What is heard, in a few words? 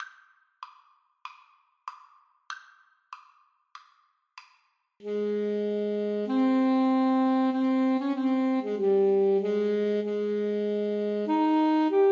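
Alto Saxophone Duet, karaoke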